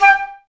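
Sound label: flute, Woodwinds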